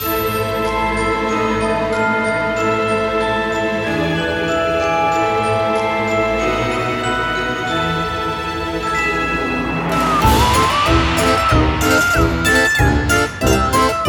Spook loop

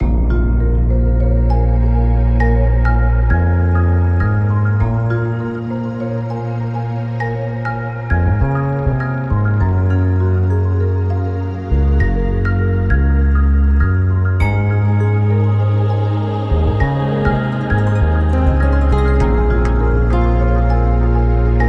Deep Sea loop

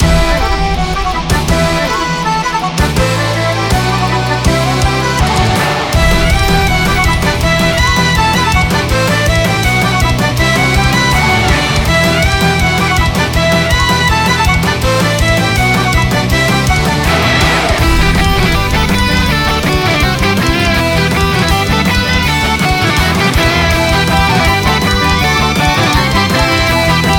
Raid Folk Metal loop

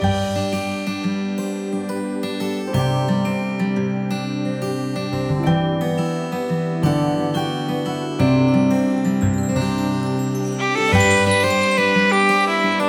Soft Day loop

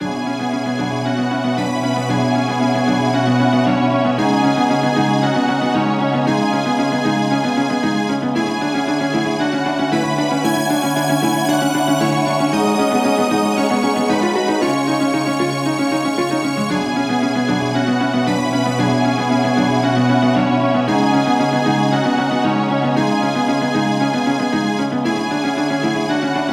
Time and Pray Retro loop

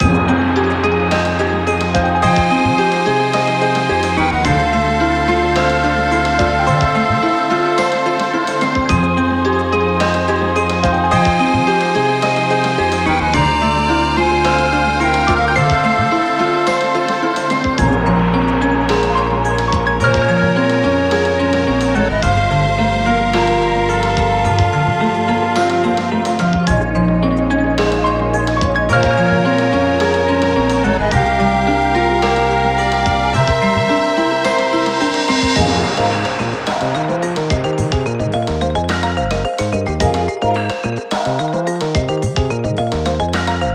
Retro RPG Dungeon loop

